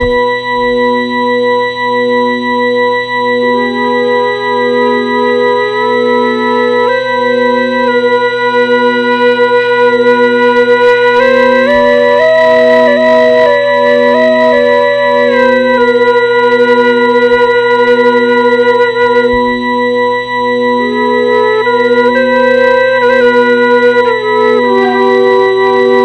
organ song, South India; South India street recordings

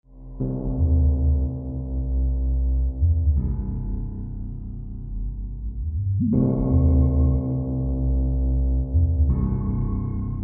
noise generator single version

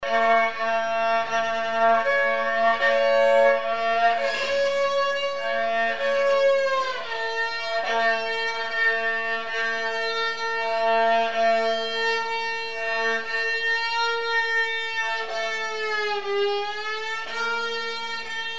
violin end; Spanish street recordings

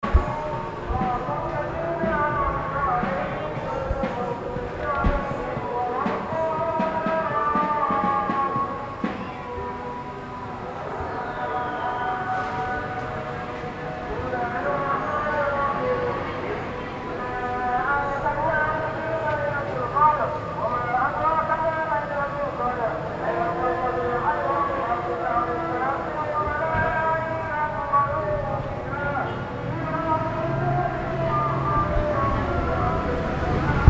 night sounds singing, Indonesia

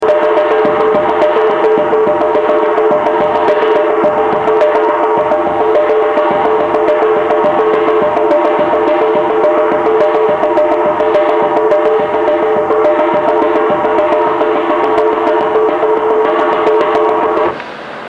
shrine dictorphone mexico, street recording

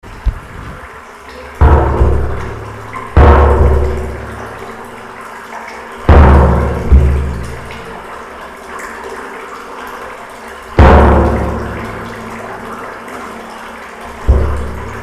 water drip port chalmers

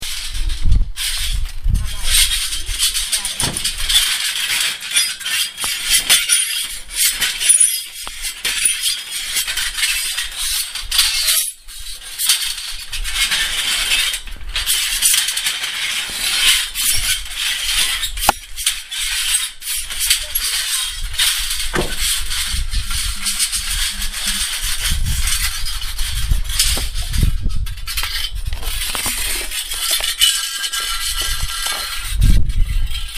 scraping sounds

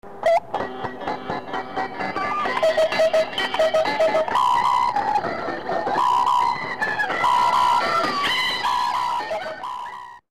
Short Song

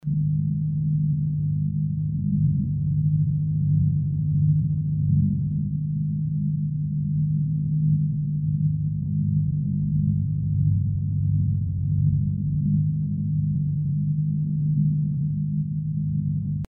electric gamelan BROKEN SPEAKER HUM HIGER

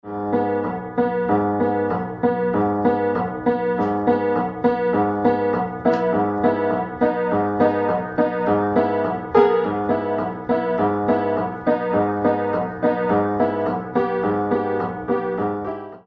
Raw piano

